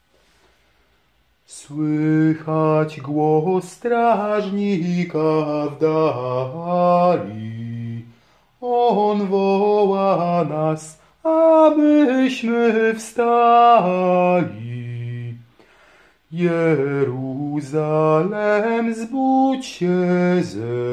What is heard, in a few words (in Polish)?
Słychać głos strażnika bas